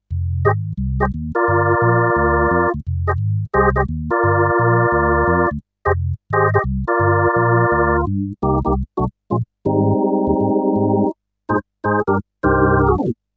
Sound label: Numa Compact 2 Organ